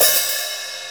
big room crash f sharp key

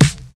smooth bottom end acoustic snare c sharp key

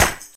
smooth clap single hit D key; single hits one shots

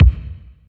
subtle reverb kick drum sample c key

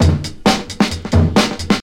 106 bpm drum loop f key

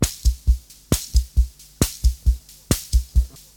67 bpm classic drum loop c key

